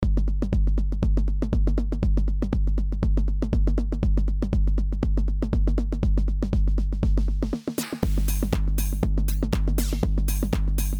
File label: SSL G Series Compressor XBase 09 Snappy max. GR 8 dB